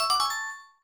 Unknown Jingle; Pokemon Mystery Dungeon Blue Rescue Team

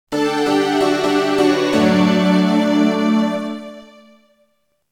STAGE CLEAR FAILED; Airline Pilots Brave Fire Fighters Job World

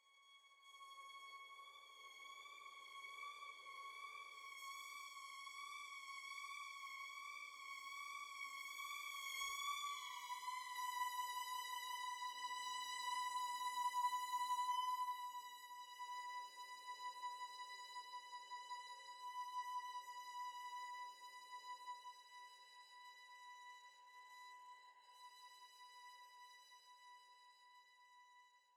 Asylum Wake Up Violin; Get Even

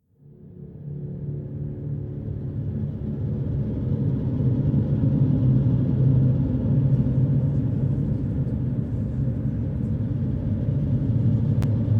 music temple ambient start

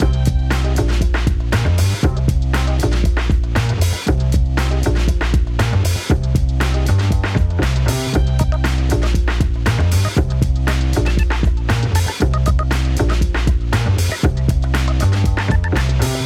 90 hiphop 118bpm; hot lava; ios macos windows

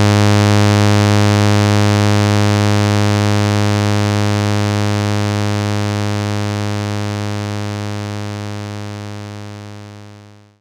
Synth Bass Loop

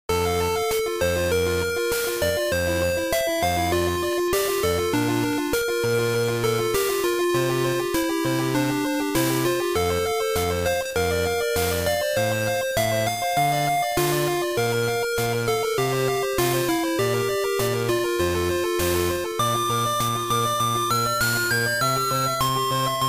Shelter

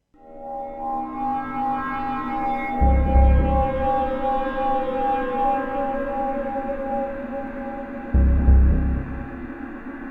Day Stinger; dying light the following gamerip 2016

